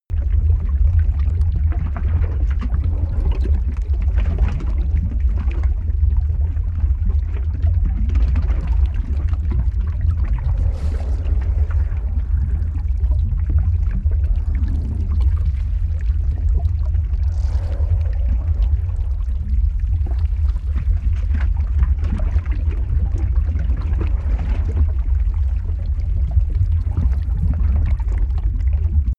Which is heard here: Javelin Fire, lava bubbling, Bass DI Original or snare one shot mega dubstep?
lava bubbling